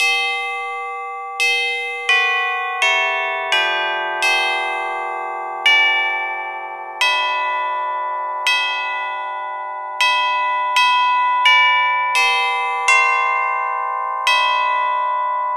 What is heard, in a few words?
Chime, carillon